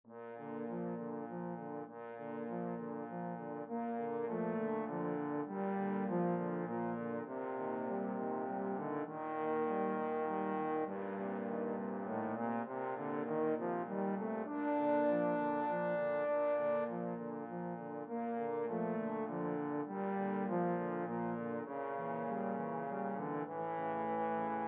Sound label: saint saens swan trombone